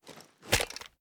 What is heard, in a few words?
reload empty end; gmod